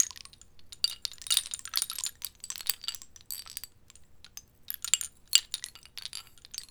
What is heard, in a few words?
Abstract Shell Loop